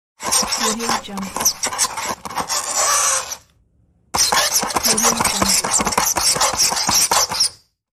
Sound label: nail scratching chalkboard; audiocellstudio